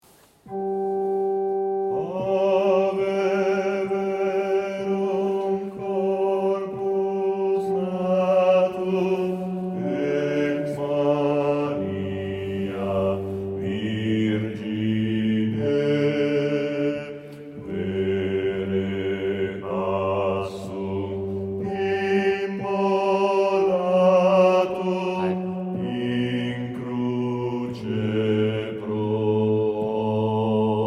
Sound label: Bass; Ave Verum corpus-E.Edgar